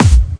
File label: Bassdrums Clubby Kicks; Vengeance Samples